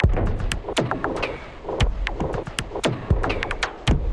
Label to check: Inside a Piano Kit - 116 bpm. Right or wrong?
Right